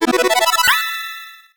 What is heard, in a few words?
Jingle All Quests Complete